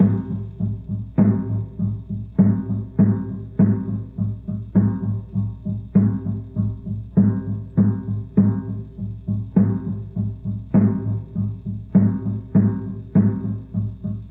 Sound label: Loop, rhythmate, classic machines